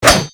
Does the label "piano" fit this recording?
No